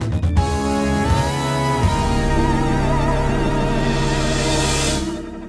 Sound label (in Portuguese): Fanfarra morte